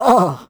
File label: hurt; Crypt of the NecroDancer